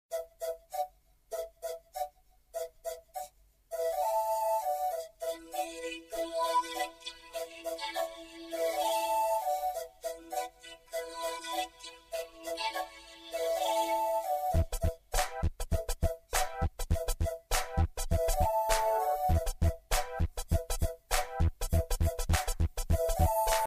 best sound ringtone

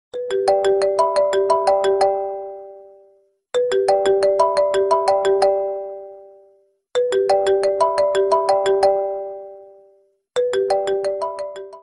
samsung galaxy ringtone

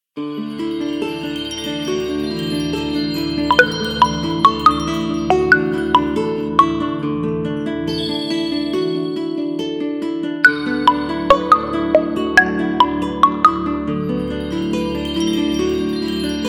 breeze ringtone; oneplus

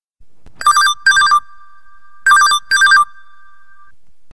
beeps alert ringtone

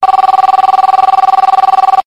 motorola grave ringtone

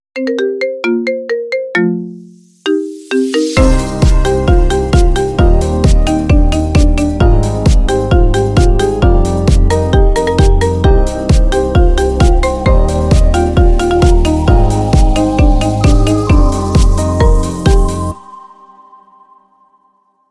dancin marimba ringtone

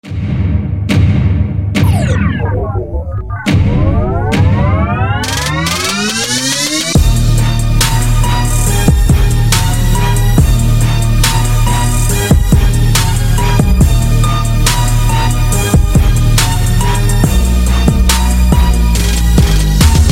black beat ringtone

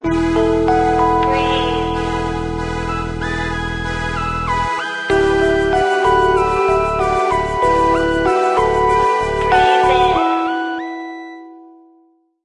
wellbeing ringtone, motorola

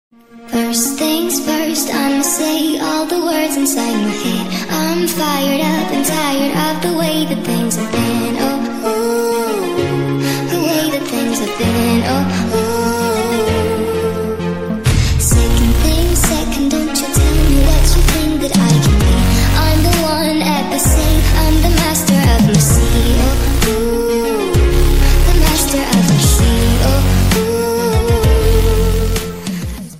female cover ringtone

